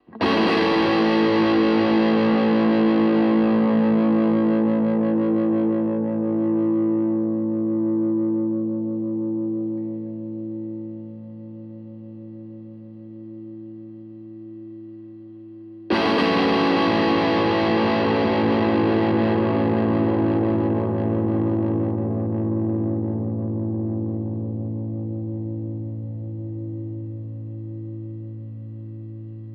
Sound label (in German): Harley Benton Vergleich HB Sustain; Maybach